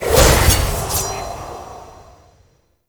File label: UI Popup In; Kuva Lich; Nemesis